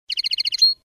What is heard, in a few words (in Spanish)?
currupin, campero